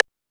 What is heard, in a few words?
Techno A, Drum Machines, EMU XL-7